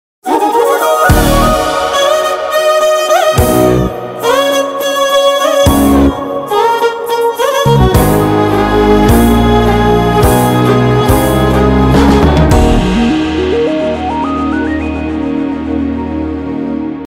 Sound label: birthday special bgm